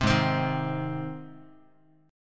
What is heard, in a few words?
G sharp Major G sharp Guitar, chord; strummed; JGuitar